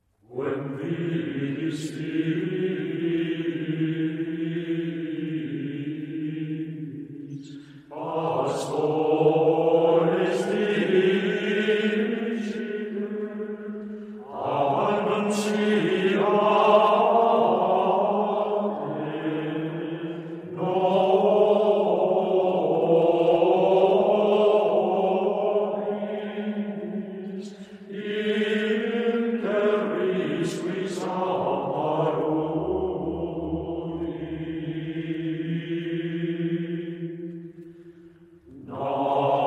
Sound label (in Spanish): canto gregoriano